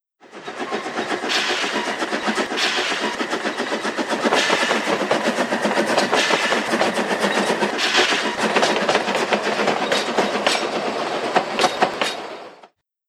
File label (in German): Zug